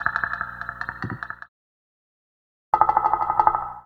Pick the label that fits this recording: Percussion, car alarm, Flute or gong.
Percussion